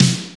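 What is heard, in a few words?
SD, snare drum; X-Static Goldmine 1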